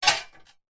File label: industrial sack truck trolley metal stand upright concrete